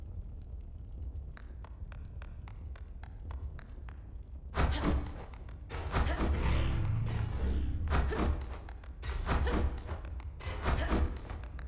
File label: footsteps; Transistor